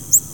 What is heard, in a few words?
american redstart